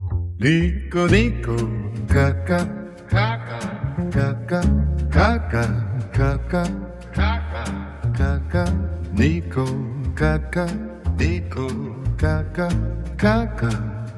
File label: jazz